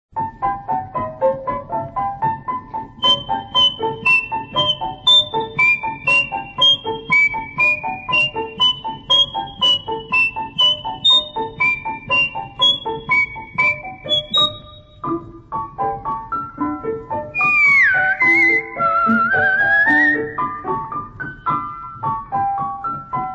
Musical Snuff Box

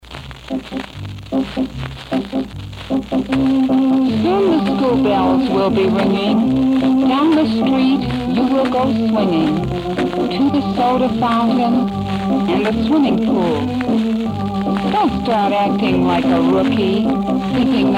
Organ and Vocal

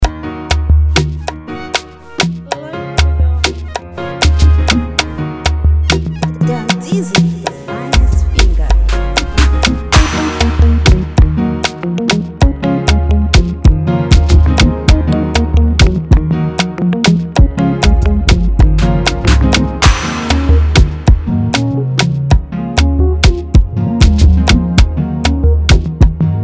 Instrumental

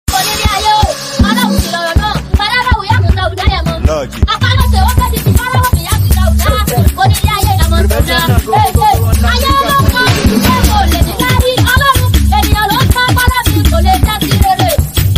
Mara Worship

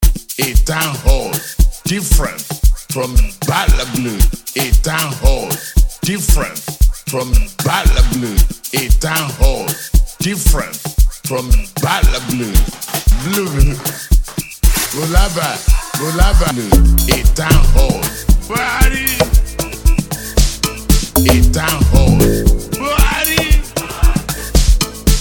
CRUISE BEAT

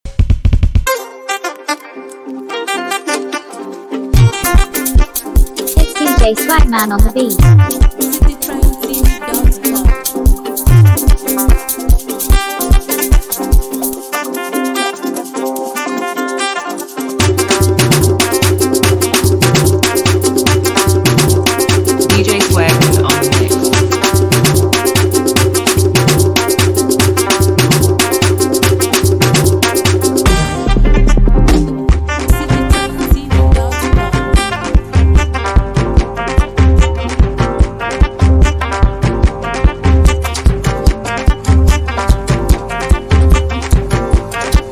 Hausa & Mali Mara Beat

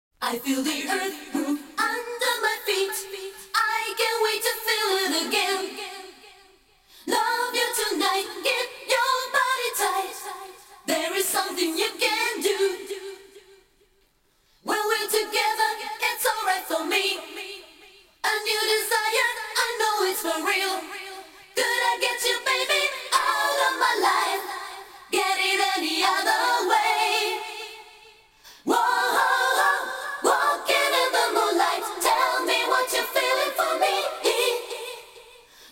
Acapella

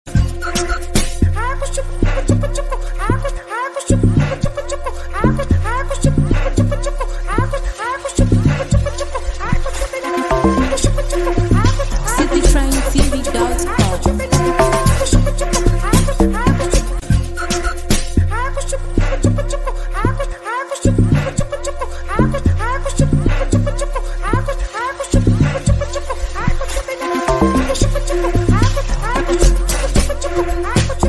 Beat

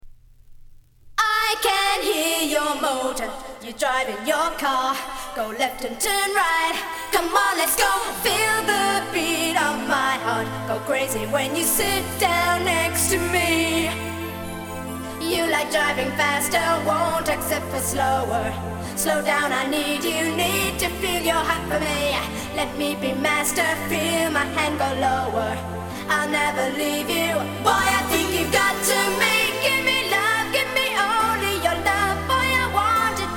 Acapella

